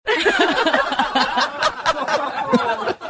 Laughter Of Women

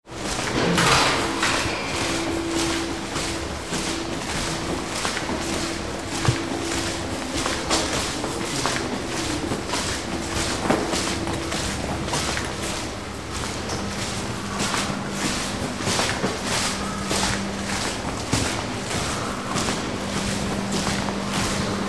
Hospital Walk

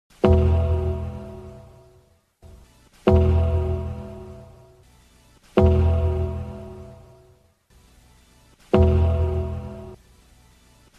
New Year Gongs

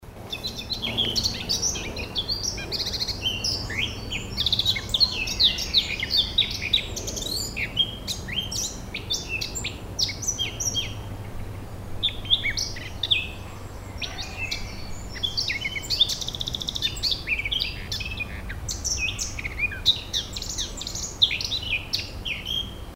Birds Flying

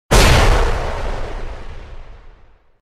Explosion

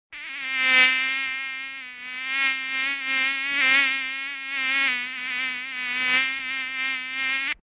Mosquito Annoying

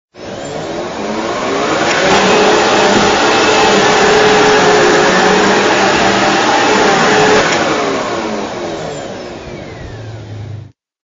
Vacuum

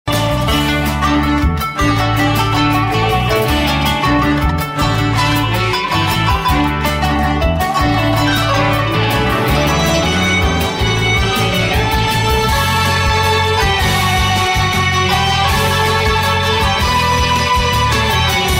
Cartoons Background Music

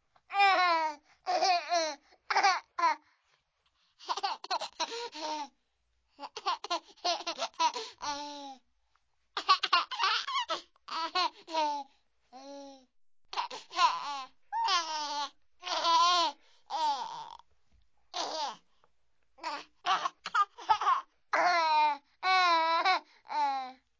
Laughing Baby

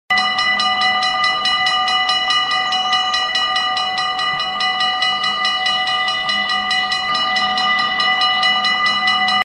Railroad Crossing

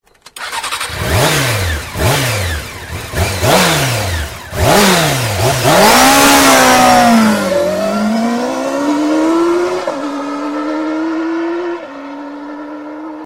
3d Bike

